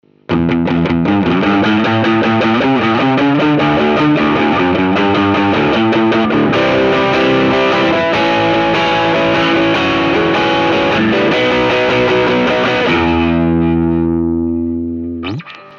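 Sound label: Tube drive, Line 6 M13 guitar processor; довольно гибко рулится тэмбр, не хайгейн, но звучит достаточно плотно, риффы, соло на ура. Действительно оч. похоже на ламповый дист.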